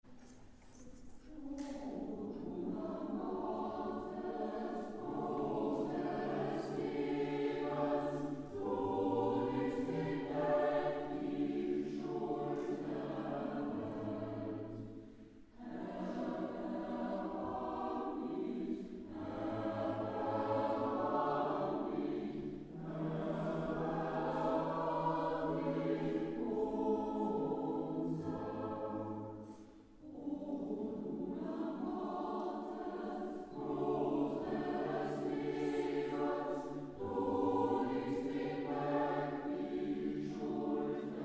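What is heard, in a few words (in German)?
Agnus Dei Alpenländische Messe, Chor; Chor Cantate nova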